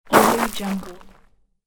gravel brake slide

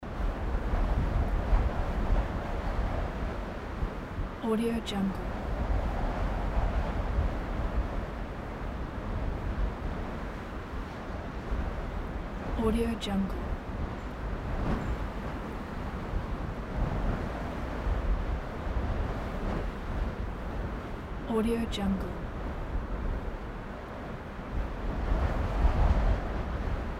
wind loop

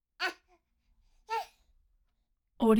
baby 15 week vox

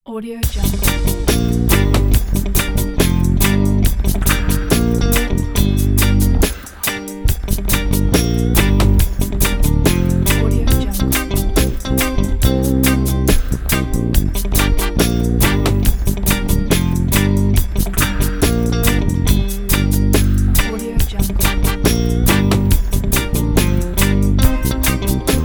reggae loop

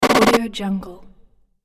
stutter glitch error

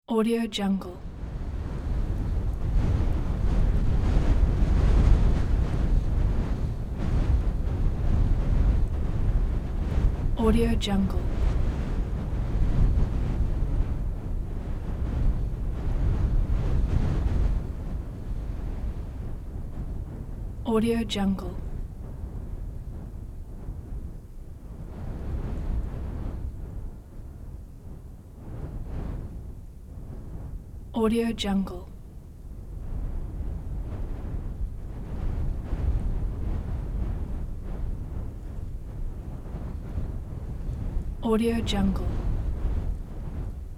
gusty wind

